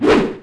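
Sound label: skeleton attack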